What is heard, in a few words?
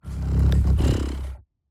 Cat Purr; neuro; InsideInfo; Real World Sounds